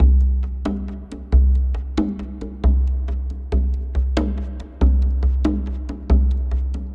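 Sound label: PROCESSED AFRICAN TALKING DRUM; Sonic Foundry (Sony Creative Software); Vir Unis Infusion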